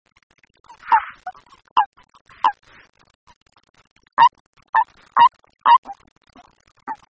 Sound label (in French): chant B. bufo